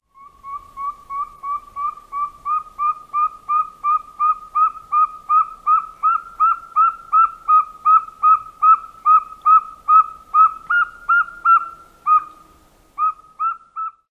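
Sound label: toot series, bird; ON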